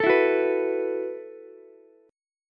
E Suspended 2nd Suspended 4th E, Ukulele; strummed; JGuitar

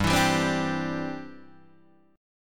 C-Major Add 9th G, Guitar Half Step Down

